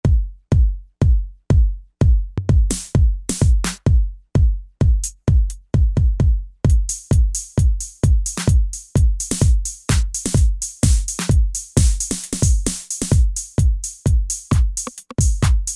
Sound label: Dance Kit